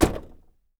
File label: SMALL DOOr